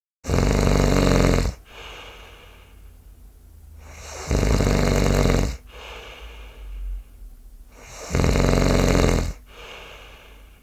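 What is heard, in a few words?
snore on repeat; hrap na povtor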